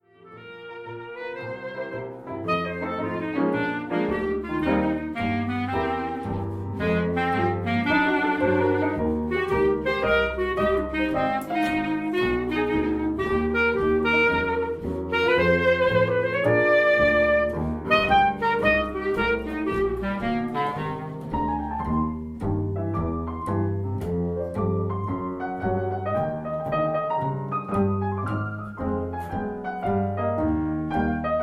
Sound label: Swing